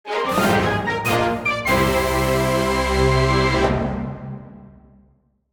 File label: Jingle Win; Goonya Fighter